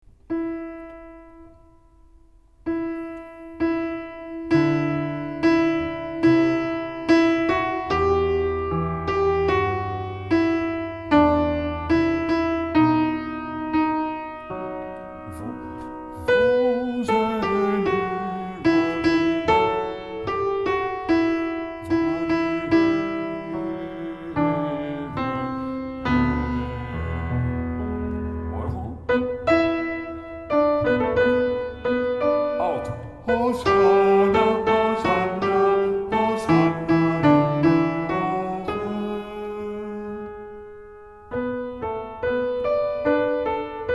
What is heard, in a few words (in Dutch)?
heilig alt